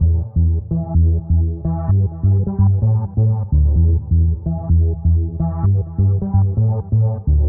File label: 128 Techno Bass Emin; Dance DJ; Digidesign; Pro Tools; Pro Tools Essential Loops